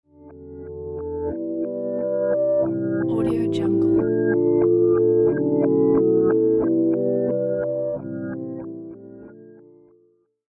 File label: psychedelic scifi transition